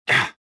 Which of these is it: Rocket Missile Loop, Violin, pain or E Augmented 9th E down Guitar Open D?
pain